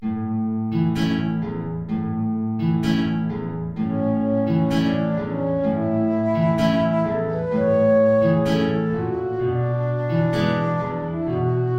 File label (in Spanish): tango